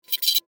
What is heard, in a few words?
Electronic Element, Scifi, Electronic, Element, Alien, Computer, Readout, Science, Fiction